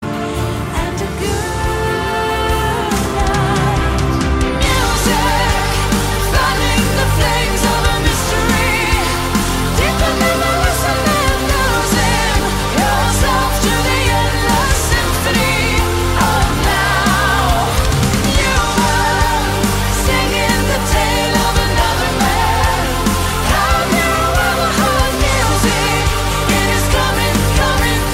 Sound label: music